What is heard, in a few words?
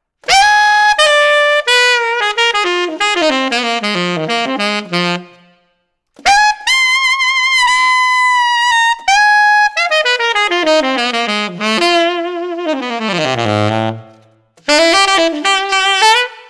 Alt Reverb, tenor saxophone; supersonic 50s dukoff 7 tenor saxophone mouthpiece